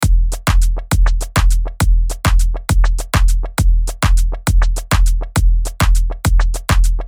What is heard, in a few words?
Trance Psytrance 135BPM